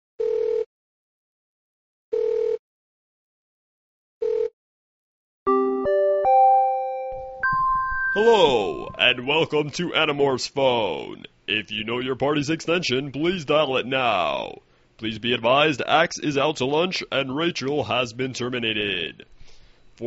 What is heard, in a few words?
Phone Machine